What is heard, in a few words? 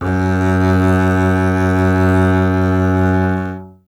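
String contrabass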